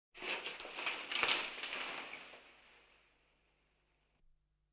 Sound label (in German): Im Badezimmer Oeffnen Des Duschvorhangs